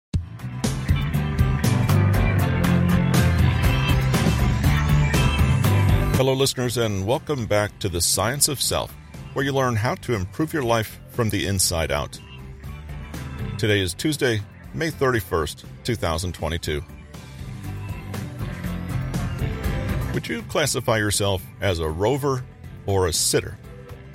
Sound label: Background Noise